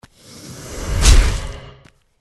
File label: attack; hero sound